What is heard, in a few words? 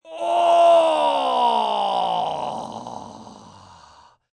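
Sigh Big male, Sigh, male, big; Sighing Sound Effects; VoiceBox; Twistedtracks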